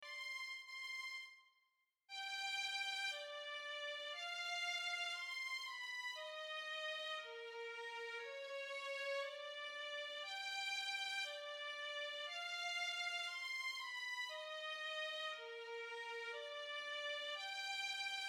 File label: Violin